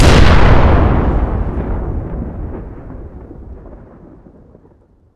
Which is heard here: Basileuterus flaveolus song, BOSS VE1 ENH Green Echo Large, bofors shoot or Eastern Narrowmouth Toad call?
bofors shoot